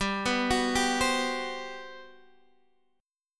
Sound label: G Major 11th